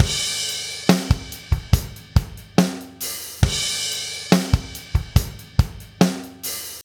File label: slow rock 70